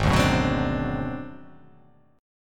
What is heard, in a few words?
G sharp 7th Sharp 9th Flat 5th G sharp down, chord; G#7#9b5; strummed; JGuitar